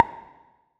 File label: link, ChromeVox Earcons, Reverb